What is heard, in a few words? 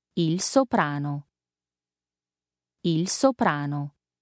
soprano